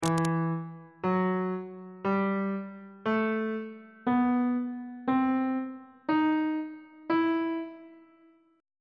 d double sharp harmonic minor scale note positions on bass clef